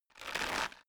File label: bow load